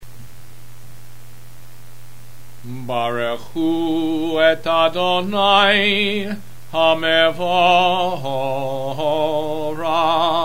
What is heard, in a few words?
barchu